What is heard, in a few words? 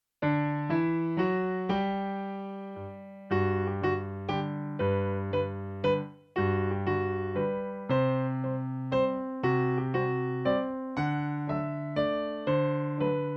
Waltz